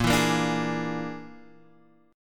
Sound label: A sharp 7th Flat 5th A sharp down Guitar Drop D